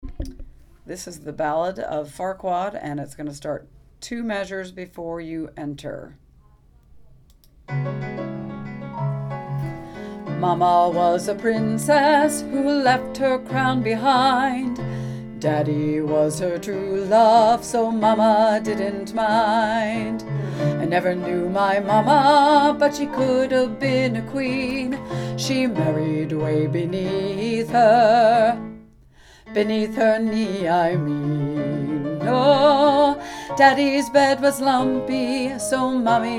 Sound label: music example; farquaad